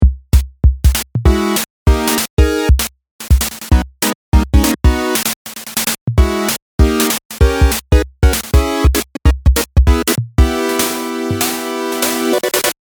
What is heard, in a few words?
Drum Chord bottom